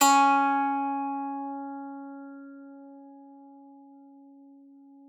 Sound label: PSAL C#4, psalter; Xsample VOL-8